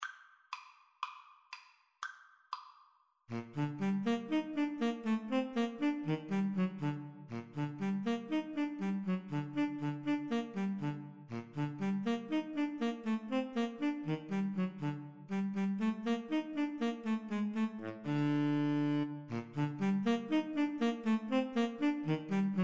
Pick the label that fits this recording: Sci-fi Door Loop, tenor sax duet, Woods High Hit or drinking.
tenor sax duet